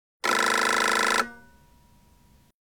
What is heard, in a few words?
Reed; Nokia; E50 WAP